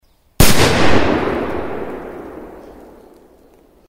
bah petardy

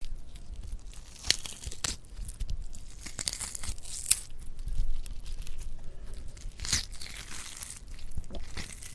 rezhut trup

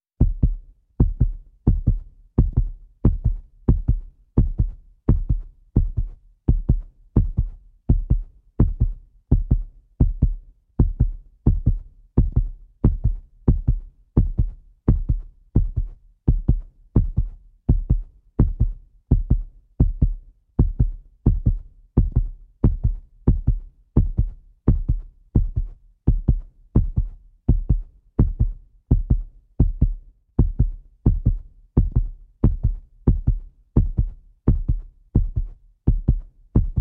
sercebienie

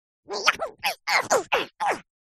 zvuk draki kak karatisty

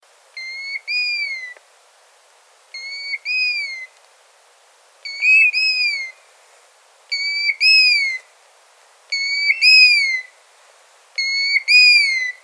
rzhanka zolotistaia, zvuk rzhanki

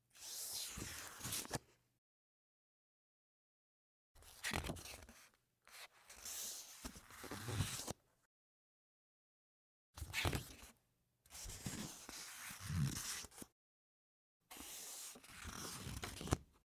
tihiy zvuk perevorachivaniya stranic

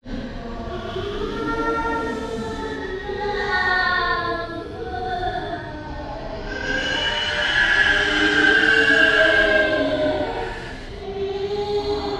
strashnye zvuki

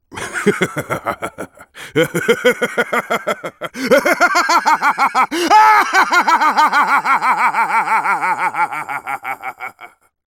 zloveschiy smeh

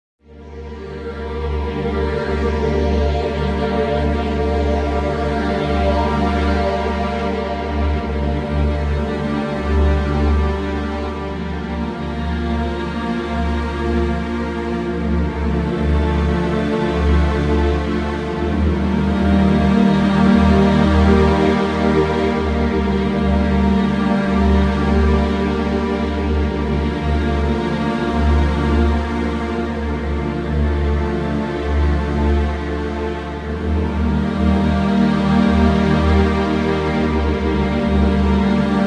tragicheskaya i grustnaya muzyka

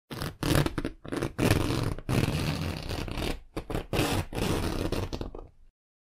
molniya sumki

